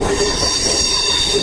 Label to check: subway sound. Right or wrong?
Right